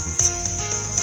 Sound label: Transporter chirp flaw filtered